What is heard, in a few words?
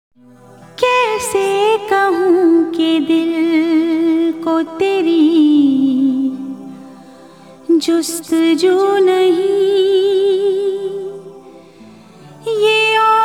Sad Version